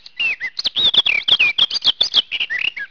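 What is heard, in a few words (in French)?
fauvette des jardins, chants d'oiseaux; sons nature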